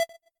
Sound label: blip; HeavenStudioPlus; mr Upbeat